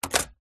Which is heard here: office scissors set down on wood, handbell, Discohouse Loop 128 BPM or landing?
office scissors set down on wood